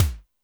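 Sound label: kick Hi Wisp, Alesis D4; 300 Drum Machines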